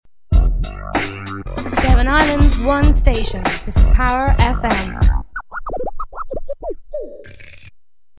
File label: scratching; 7 islands; jingles